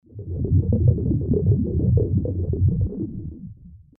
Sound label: bubbling deep